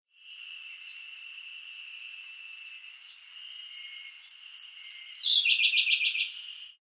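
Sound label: Siberian blue robin; koruri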